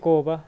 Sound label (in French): chanter